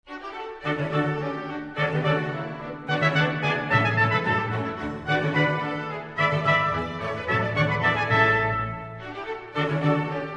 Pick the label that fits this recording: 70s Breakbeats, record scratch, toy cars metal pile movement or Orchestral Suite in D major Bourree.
Orchestral Suite in D major Bourree